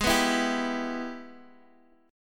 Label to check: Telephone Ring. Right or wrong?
Wrong